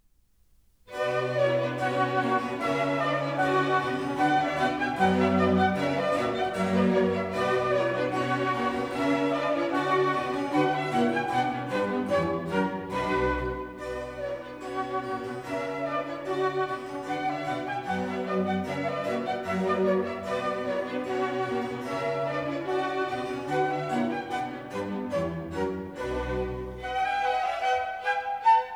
Bach Orchestral Suite; Orchestral Suite No2; Karajan

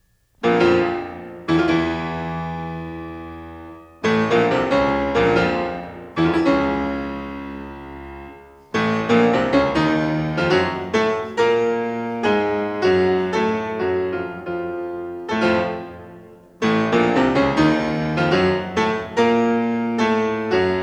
Pictures at an Exhibition for piano; Mussorgsky; Samuel Goldenberg und Schmuyle

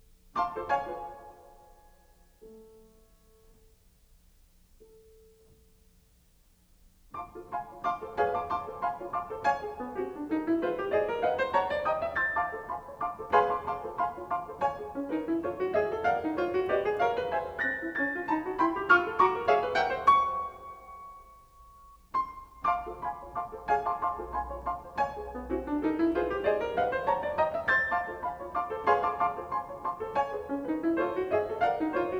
Pictures at an Exhibition for piano; Mussorgsky; Ballet des petits poussins dans leurs coques

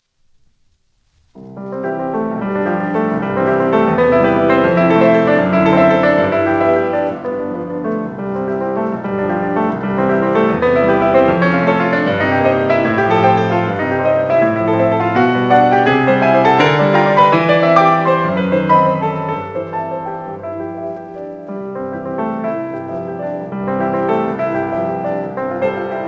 Preludes; Chopin; Op28; Lympany

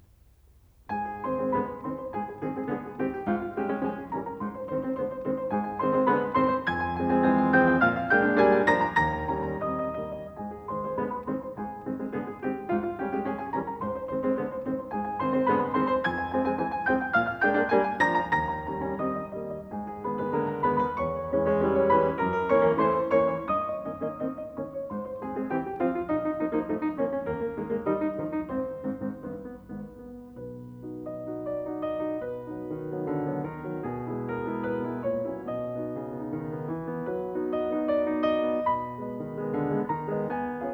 Carnaval for piano; Schumann; Op9; Rubinstein

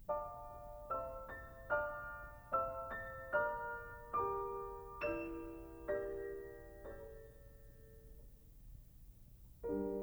Pictures at an Exhibition for piano Promenade; Mussorgsky; Graffman

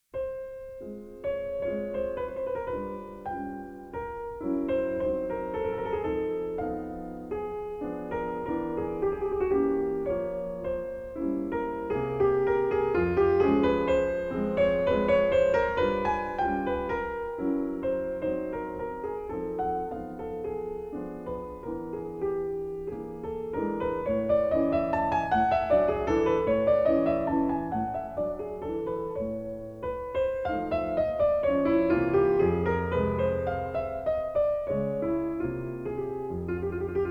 Mazurka; Chopin; Malcuzynski

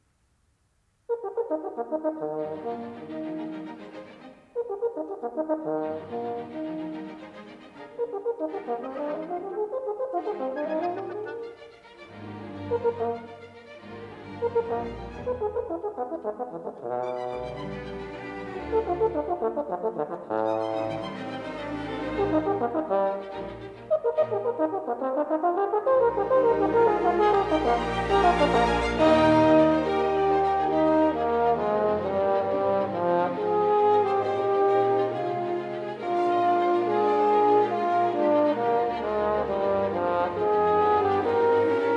Horn Concerto; R Strauss

